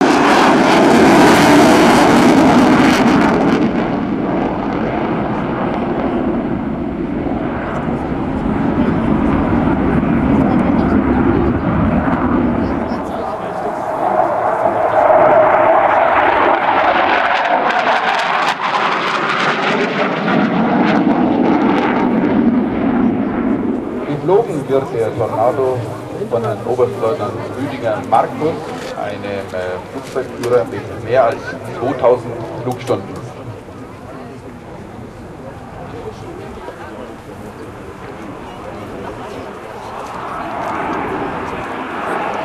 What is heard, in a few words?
panavia tornado